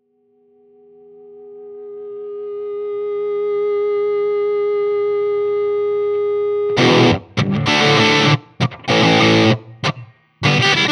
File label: TRIWATT 12AT7 Cyclone Bridge Duncan TB5 Fanes; trinity